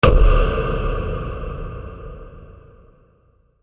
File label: Cinematic Drum Strike Stark Power; Low Impact Sound Effects